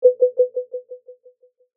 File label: Beep Sonar Blip, Scifi, Beep, Sonar, Blip, Delay, Science, Fiction; Tech